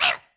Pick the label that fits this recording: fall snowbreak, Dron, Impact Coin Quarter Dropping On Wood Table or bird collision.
bird collision